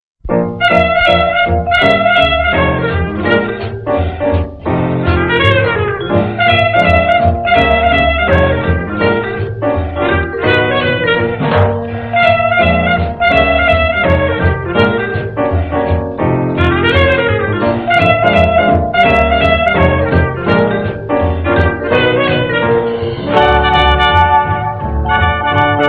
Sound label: Jazz Japan